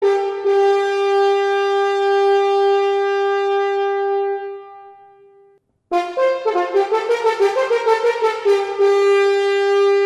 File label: horn call; siegried